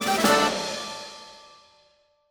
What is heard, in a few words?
jingle combo end; Seven Seas Solitaire